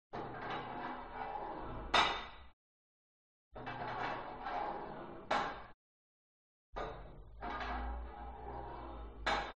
SPORT GYM Put Light Weight Onto Barbell Soft Reverby